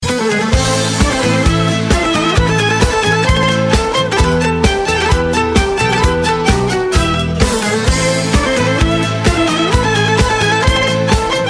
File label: Turkish Marsh Turkey Ringtone